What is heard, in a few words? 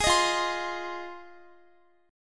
F Minor 7th F Guitar; strummed